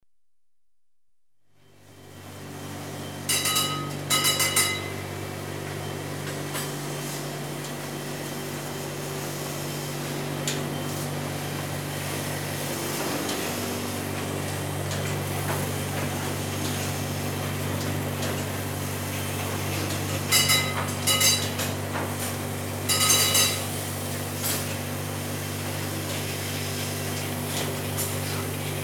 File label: Engine Room Telegraph, engines start; The BBC Sound Effects Library